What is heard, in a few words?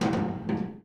cable stress; ambient; Counter Strike Model Pack; CS:GO; dust2